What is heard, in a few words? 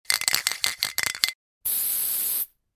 Spray paint shaking and spraying